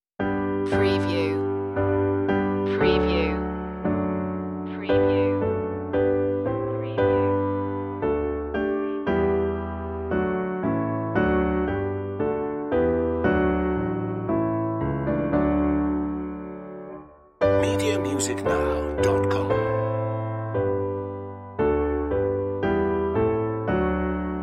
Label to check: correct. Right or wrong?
Wrong